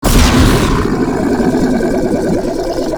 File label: flying mouth attack inhale; Enemies; Warframe; ManInTheWall